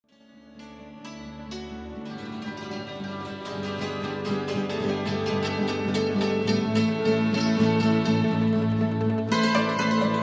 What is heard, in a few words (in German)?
grichische honga, klzmr